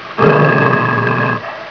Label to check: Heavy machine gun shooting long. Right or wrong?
Wrong